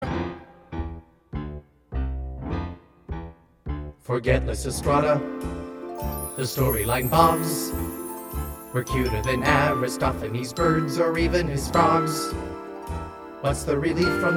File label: Greek Chorus Boy